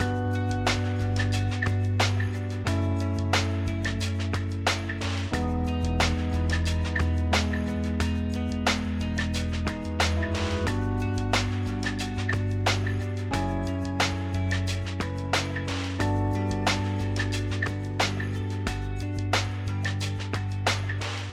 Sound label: Mood Normal Light